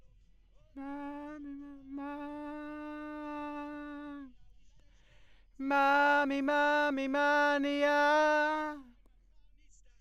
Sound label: B. Vox